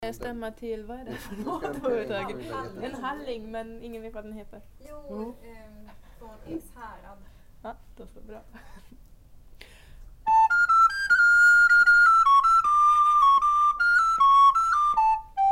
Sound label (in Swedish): Stämma till Halling från Ekshärad